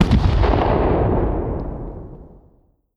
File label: medium armour fire; Hearts of Iron IV Death or Dishonor